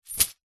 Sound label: purse pouch leather coins inside catch